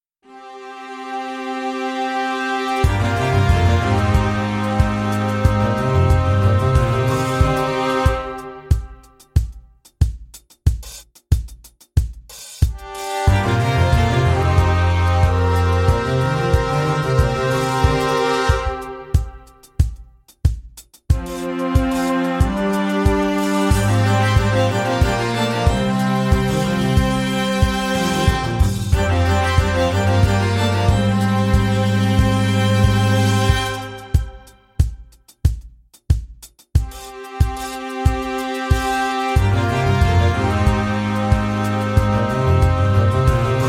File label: prelude; plvsaa; english